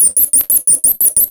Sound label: CHIRP, Anime Sound FX, SCIFI